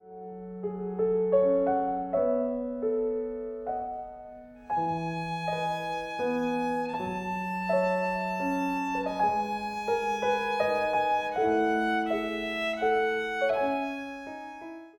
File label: VIOLIN